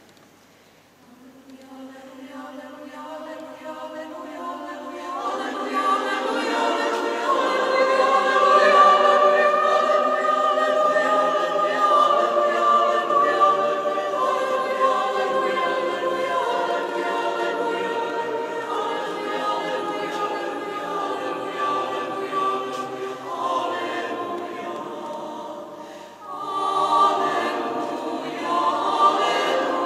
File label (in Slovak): Aleluja; Lipka